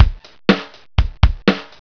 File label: beat